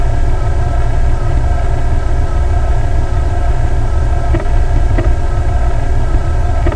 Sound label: Gear; Virtual Wings